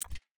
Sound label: ui click cancel